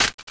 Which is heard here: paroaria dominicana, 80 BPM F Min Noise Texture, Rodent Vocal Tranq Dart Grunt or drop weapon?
drop weapon